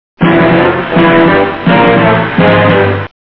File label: Trek; valve; grimtrek